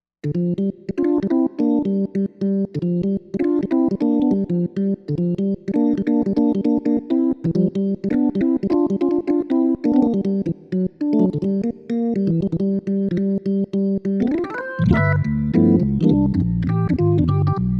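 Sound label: Organ Jazz B3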